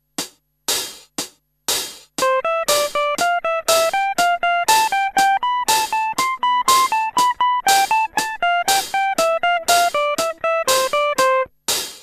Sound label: finger medium